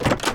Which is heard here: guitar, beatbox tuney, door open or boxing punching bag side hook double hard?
door open